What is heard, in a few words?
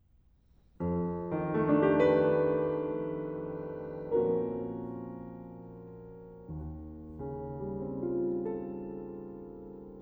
for Trumpet Flute and Piano; 1. Dances for Insecure Nobility